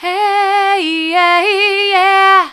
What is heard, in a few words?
hey yer soft, vocal